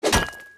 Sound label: hit